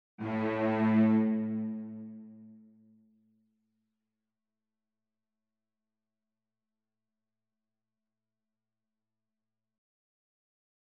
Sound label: instrumental; Handel; Messiah